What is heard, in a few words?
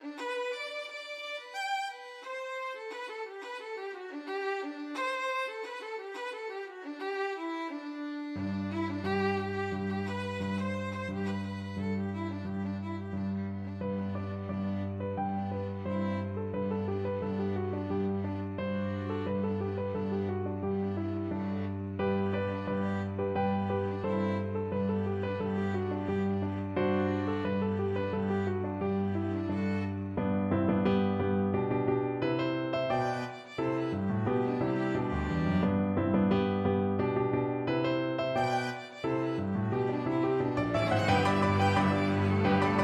cotton eyed joe, piano quartet